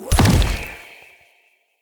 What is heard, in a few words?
Corruption Wave Spike Burst; Enemy; Lady Deora; Abilities